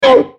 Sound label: Transform Jump; Dinosaur